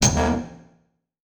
Title Transition Burst; Adobe; Production Element

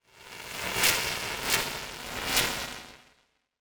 Transition Distortion Explosion; Production Element Title Transition

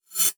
Multimedia Internet CD-Rom Flash Whoosh Short

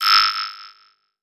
Digital; Adobe; Multimedia Internet CD-Rom Flash

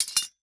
Impact Spurs Drop On Concrete

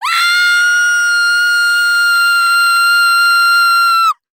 Horror Scary Human Female Screaming Long

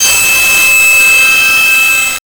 Transition Noise Static; Production Element

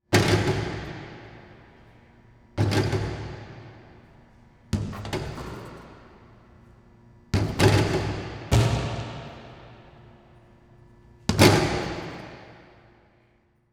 Sports Basketball Shooting Baskets In Gym

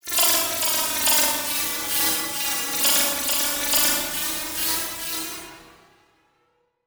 Title Transition Electrical Pulse; Adobe; Production Element

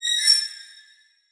Transition Metallic Hit

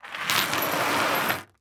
Industry Chain Metal Chain Slide On Desk

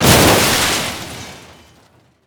Crash Car Metal Debris; crashes; AdobeCC

